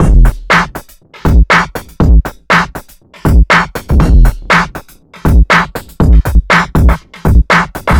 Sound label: rem hip 120bpm, downbeat dance grooves